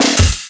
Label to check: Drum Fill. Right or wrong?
Right